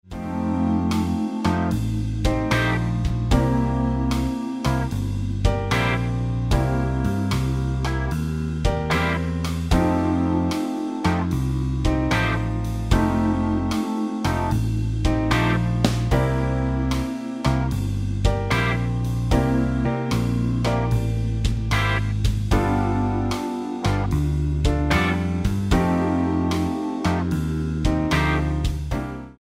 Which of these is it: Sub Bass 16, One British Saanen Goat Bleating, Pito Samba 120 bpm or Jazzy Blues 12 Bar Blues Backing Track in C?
Jazzy Blues 12 Bar Blues Backing Track in C